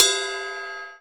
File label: Ride Bell; GMPercussion; 3DO; Toolkit 1.5; GMPercussion44k